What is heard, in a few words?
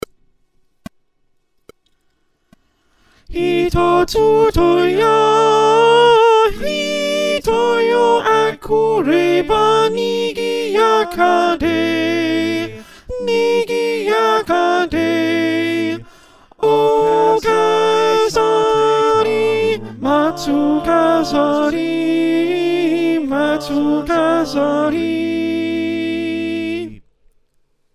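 soprano, choir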